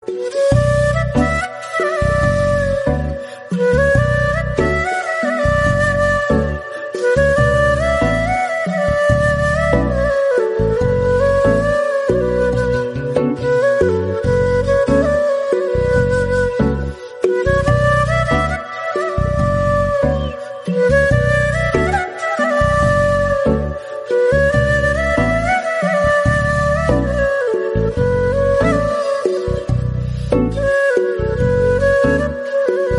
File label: Flute Instrumental Song